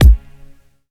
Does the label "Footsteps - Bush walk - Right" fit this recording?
No